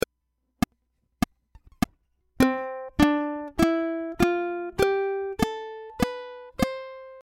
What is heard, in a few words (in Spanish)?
C Escala Despacio Click